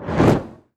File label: mana; wagic; mtg